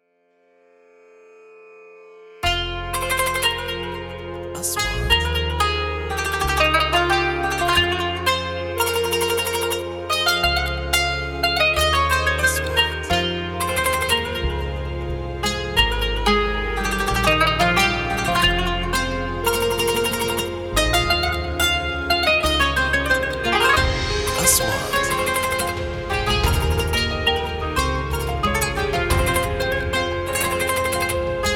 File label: events